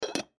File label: Clay Pot Set Down, Foley Clay Pot Set Down; Pots And Pans; Restaurants; Blastwave